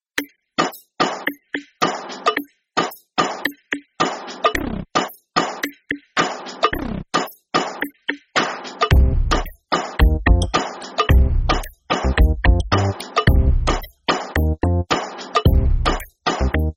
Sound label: Drum Beats